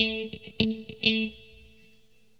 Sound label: GUITAR, ROCK POP; MAGIX